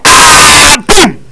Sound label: boom